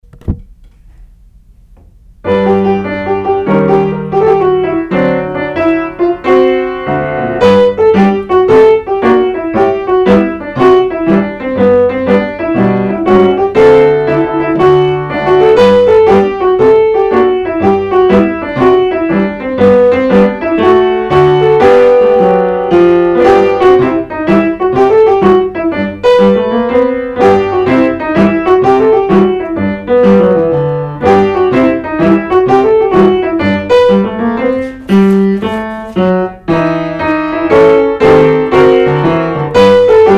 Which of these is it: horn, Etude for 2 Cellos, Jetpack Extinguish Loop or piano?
piano